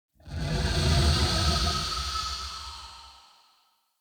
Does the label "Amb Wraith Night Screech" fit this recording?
Yes